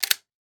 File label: Weapon Gun Shotgun 12 Gauge Pump Release; AdobeCC